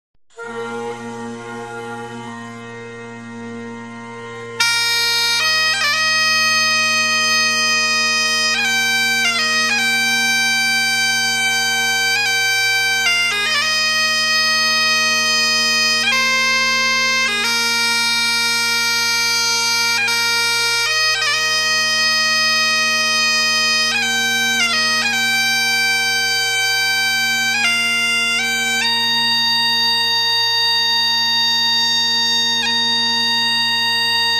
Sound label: Kilted Music; HIGHLANDER